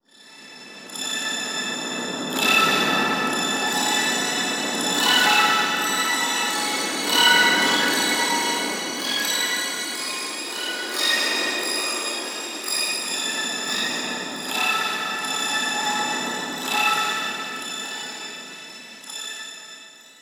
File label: winter breeze